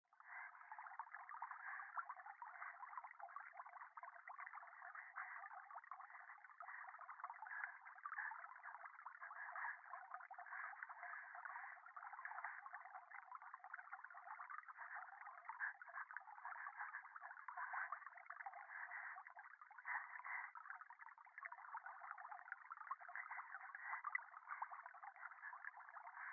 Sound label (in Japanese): 電話 右耳 快感 吐息 川のせせらぎ